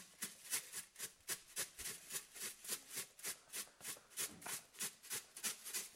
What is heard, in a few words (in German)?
Geräuschewerkstatt alte Dampflok; Klangexperimenten